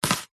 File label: crunchy vegetable single chop with knife